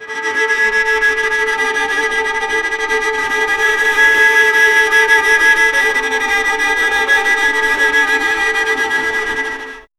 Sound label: violin 800 Hz volume 70; strings